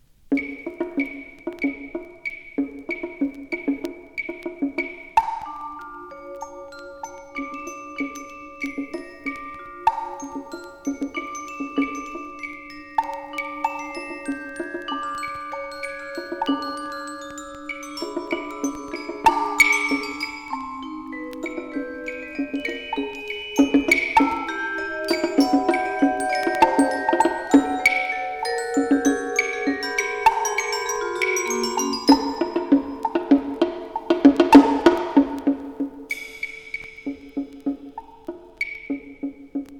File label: SCHERZO FOR PERCUSSION